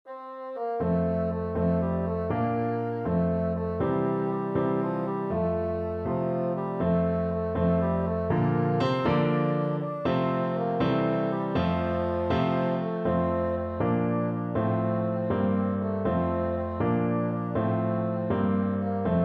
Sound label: bassoon